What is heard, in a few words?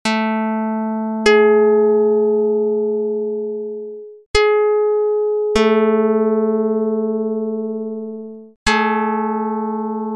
pluck dr220; Jid 48/25